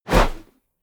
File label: whoosh air